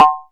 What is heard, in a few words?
SYN PERC, Korg EMX-1, Drum Machines